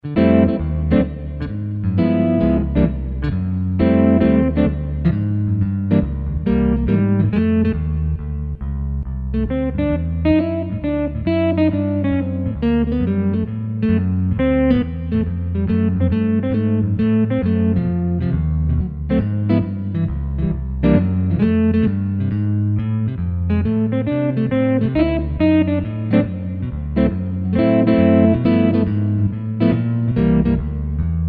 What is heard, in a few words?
Dyn Jazz Guitar, Kurzweil K2000; Kurzweil K2000 Contemporary ROM